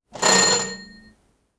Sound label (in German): klingel; geraeusche